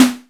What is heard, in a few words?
Snare